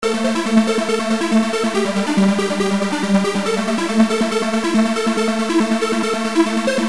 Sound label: thin lead